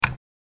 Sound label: click button